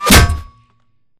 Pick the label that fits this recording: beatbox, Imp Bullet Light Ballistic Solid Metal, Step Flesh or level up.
Imp Bullet Light Ballistic Solid Metal